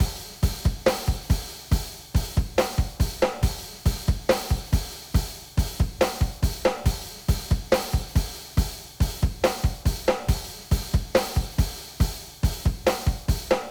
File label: rock